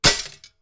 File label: Shooting With Airgun Indoor